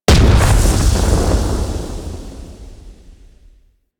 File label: Void Arena Ghost Comet Explosion; Warframe; Quests; Wraith Quest